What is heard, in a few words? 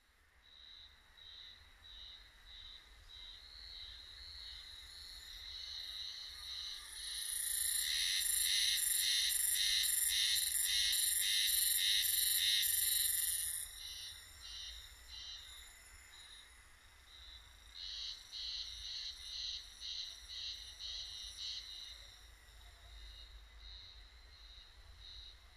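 spring cicada; haruzemi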